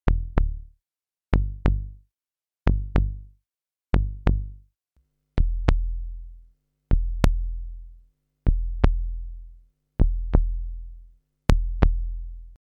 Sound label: drums; E mini